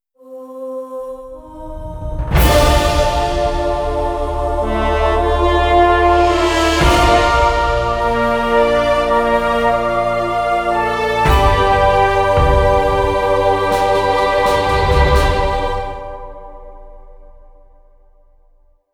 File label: Round Start; Zombie Cosmodrome